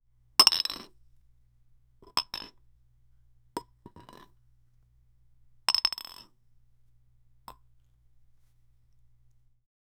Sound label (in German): langsam eine glass, fruit; 3 mal